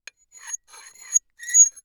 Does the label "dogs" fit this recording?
No